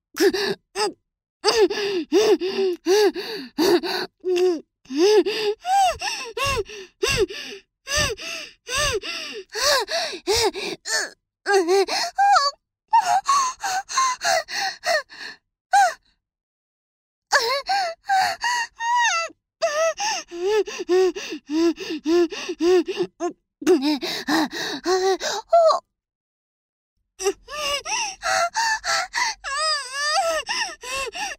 可愛い喘ぎ声 音割れ注意; 早瀬さん

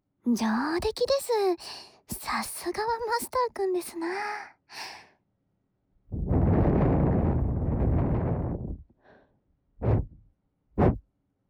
鉱物つながり、銀の耳かきでの左耳耳かき; 昔話安眠導入; 蓄音レヱル むむむ; CV.楠木ともり

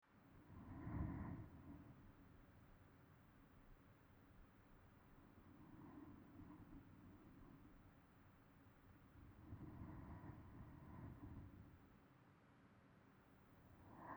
寝息ループ（ループトラック）, ASMR; 蓄音レヱル; 陶器耳かき・粘土マッサージ

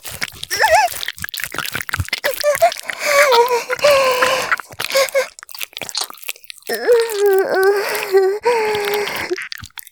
絶頂 中出し; 騎乗位

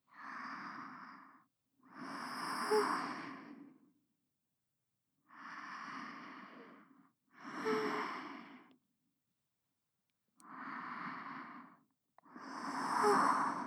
寝息

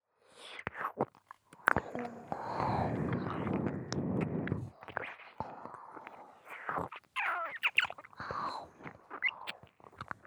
ひたすら超耳舐め; 女神様; KU100; ウィスパーボイス

